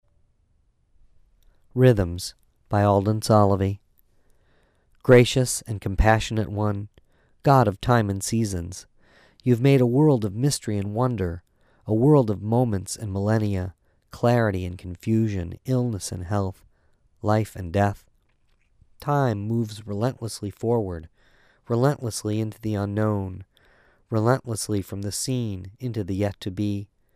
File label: rhythms